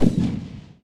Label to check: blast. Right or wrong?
Right